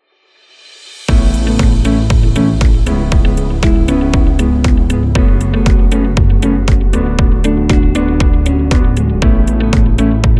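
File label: Healthcare, motivational corporate music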